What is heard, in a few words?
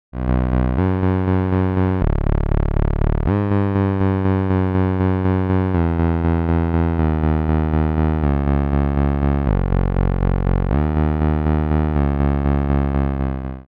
telephone game Noise input SLEW output; Snazzy FX